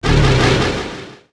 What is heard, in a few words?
magic